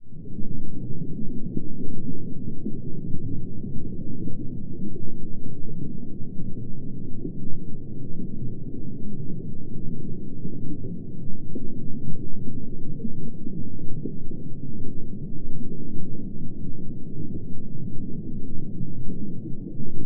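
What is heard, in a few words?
sounds of human footsteps in water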